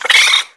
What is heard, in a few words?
mole, water balloon; Birthday Party Bash; Wii; Spanish